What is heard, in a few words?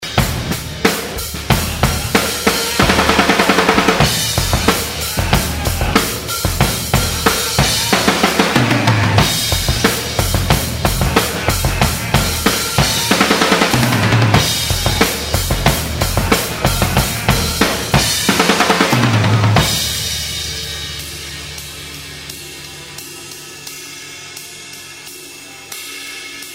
Drum outtakes